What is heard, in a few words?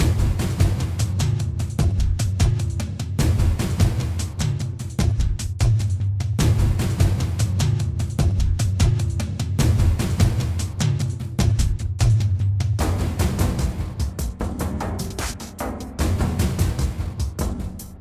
Boss Battle Loop Perc Rhythm Menu Music